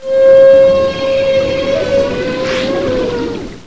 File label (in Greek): ΝΤΟΥΕΤΟ ΜΕΓΑΠΤΕΡΗΣ; Φάλαινα; Whale; ΗΧΟΙ ΦΑΛΑΙΝΑΣ